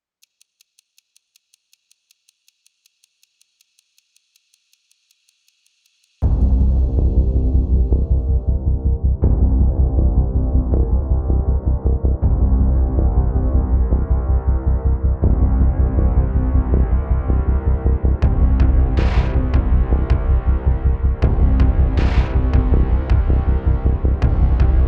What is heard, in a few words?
Reaching The Peak Underscore, Epic Hybrid; Narrative Mind